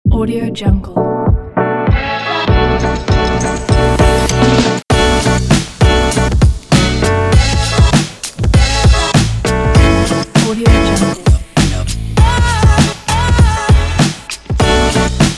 it is hip hop

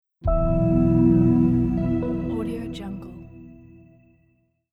short logo digital ambient

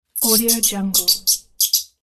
rat squeaking

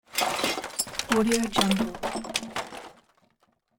junk debris spill; hollywoodedge

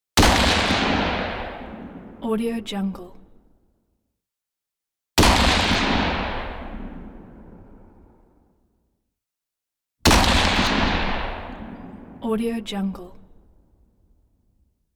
m4 shots

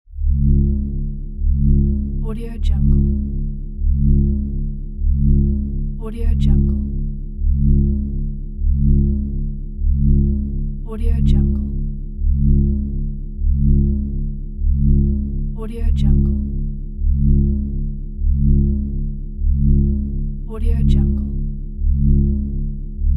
forcefield energy hum; applehillstudios

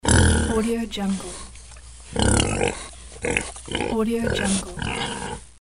pig; lodosound

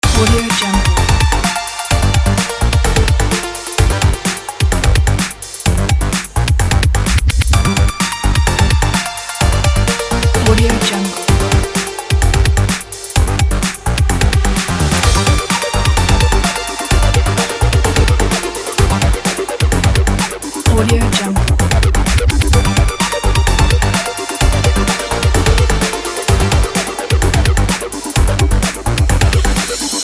background breaks dance loop

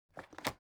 foley book take shelve hard; smartsoundfx

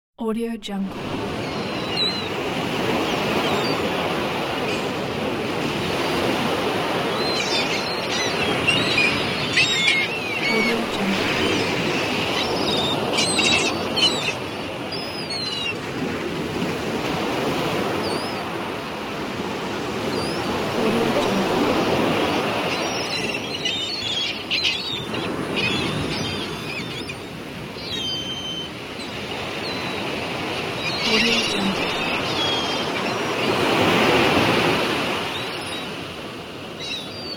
seagulls and sea

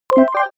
ui arcade beep positive

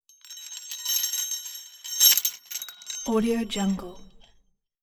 pitched just chains tighten whip swing; soundbits-soundfx

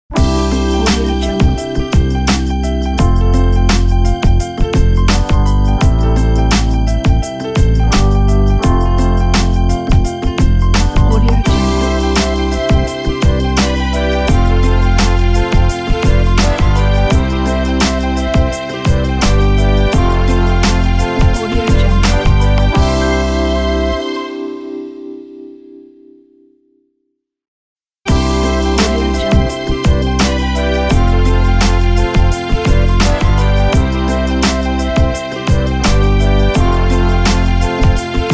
warm ident

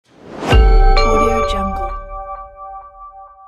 message sound fx

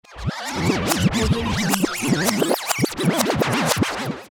rewind

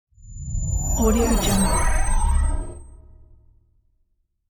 mysterious sci fi transformation

